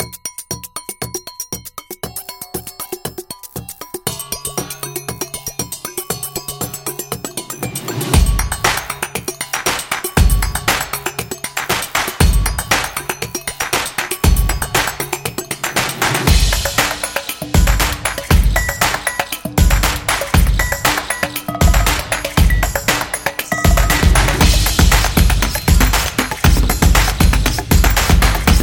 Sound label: Kitchen Background